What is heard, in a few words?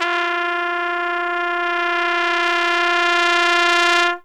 TRUMPET FX, Phantom Horns; Zero-G